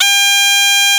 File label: Shenai A#3